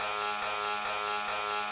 buzzer loop

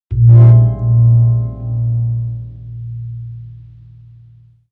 Wrong solution; Rosegarden

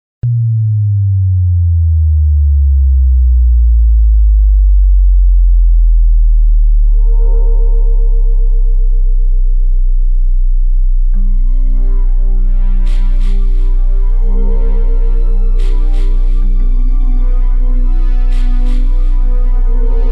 Boston Acoustics Bass Test, Woofer Cooker; Thx; Bass Test Cd

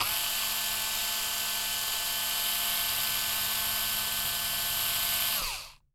ELECTRIC DRIVER; AKAI; 1000 Sound Effects

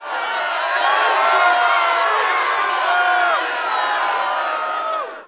crowd cheer

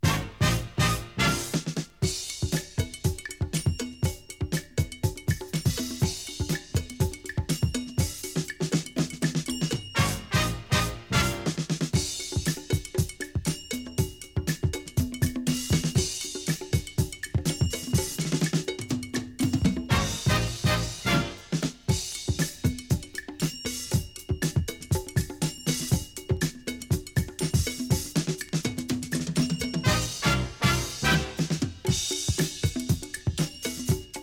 topformat maxi jingle single

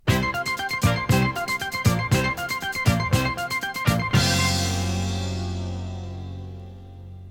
topformat maxi jingle single

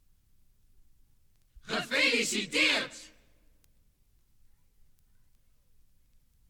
topformat maxi jingle single

